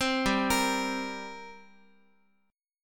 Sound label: G Minor Double Flat 5th, G, Guitar; arpeggiated; JGuitar